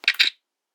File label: camera